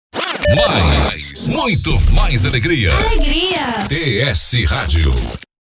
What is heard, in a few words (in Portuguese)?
vinheta